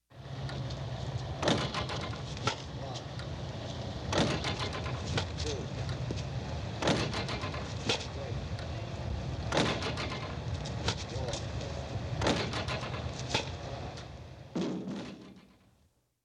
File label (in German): Sport Baseball Ballmaschine